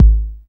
909 BD, TR-909; Best Service Dance Mega House Dance

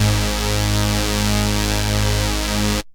EURO G#1, lead synth; Best Service Alpha Dance vol.2

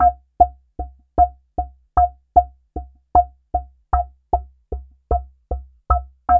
PEAKY LOOP, MISC DIGI PERC; Zero-G Ambient 1

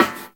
SD, HIP, Dance Mega Drums; 90 s Sample CDs; Best Service

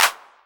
Clap, Novation Drumstation; DrumstationV1

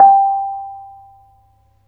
BONANG G5; Spectrasonics; Heart of Asia

